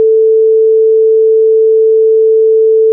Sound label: sin 442Hz; 3dBFS; 3s